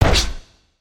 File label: hit, creatures organic